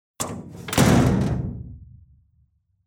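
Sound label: door slam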